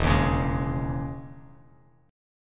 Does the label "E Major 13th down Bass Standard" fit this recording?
Yes